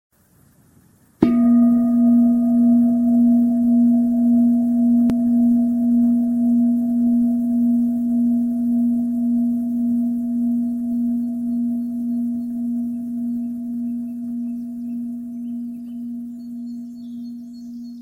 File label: presence bird song